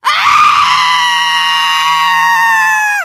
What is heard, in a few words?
fall, teengirl; source engine; left 4 dead 2